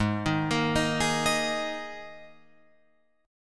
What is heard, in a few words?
G sharp Suspended 2nd, G sharp, down, Guitar, Open F; G#sus2; arpeggiated; JGuitar